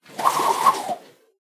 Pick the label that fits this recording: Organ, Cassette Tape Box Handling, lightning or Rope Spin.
Rope Spin